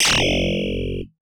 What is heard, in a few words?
Coin; crude buster; arcade